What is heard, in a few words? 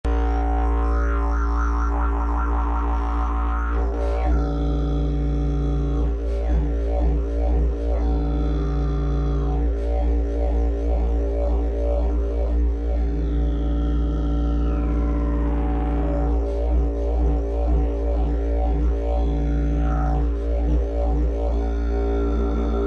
jilm ultrabass, didgeridoo; 32 mm; vypalování, olej; Asi; Tomáš Dufek